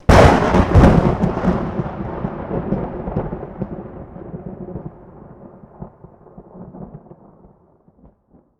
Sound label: thunder